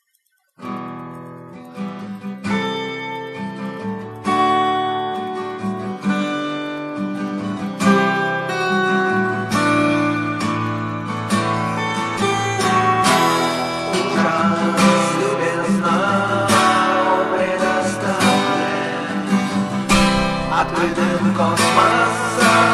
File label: horror